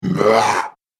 Orc Gets Angry; Monster Sound Effects; horror sound effects

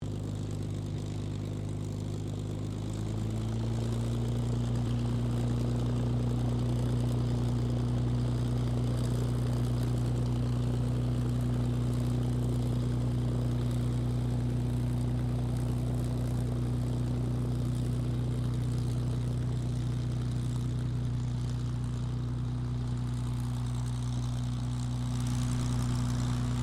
AIRCRAFT P-40F KITTYHAWK TAXI; 1942; P-40F Kittyhawk / Warhawk/Tomahawk; Airplanes (Sound Effects:Vehicles)

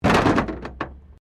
Wreck Door Panel Clunk Metal

